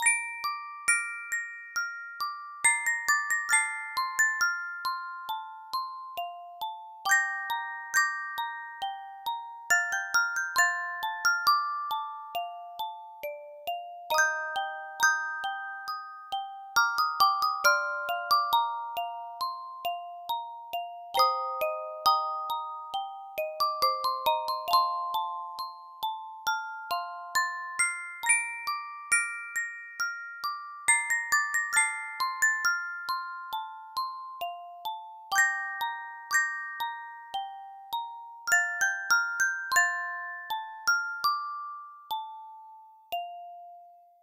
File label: Music Box; Compatibility Tester